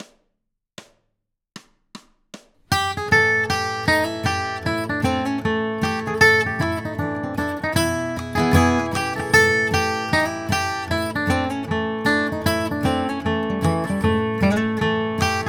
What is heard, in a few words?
Bluegrass Guitar, modal banjo tune with extra beat G